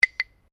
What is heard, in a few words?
Clap Sticks (Bilma) Large Double, Traditional Australian Aborigine rhythm sticks; Miscellaneous Musical